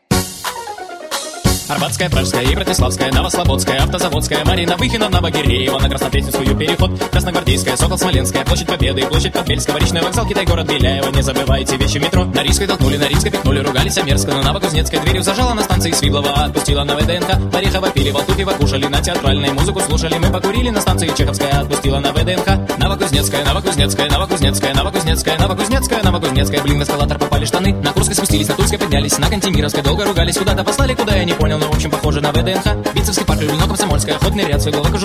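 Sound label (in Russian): Rington dlya telefona Metro, ringtone for telephone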